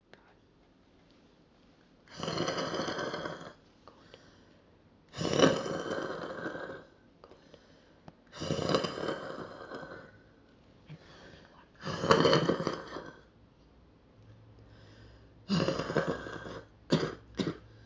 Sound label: Staged huff with sputum